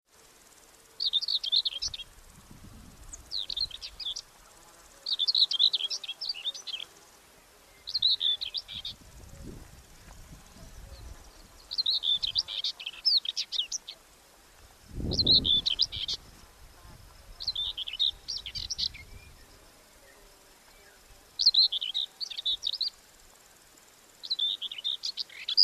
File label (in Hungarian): mezei poszáta hangja